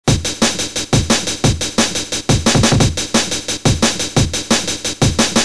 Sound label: loop